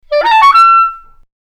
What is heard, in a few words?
Different timbres of the same pitch on the clarinet